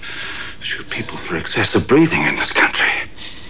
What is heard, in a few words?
breathing